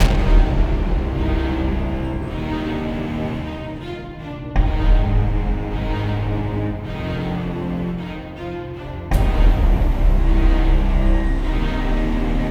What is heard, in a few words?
music intro; Golden Crayon Sounds